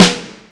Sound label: snare sample f key; snare drum samples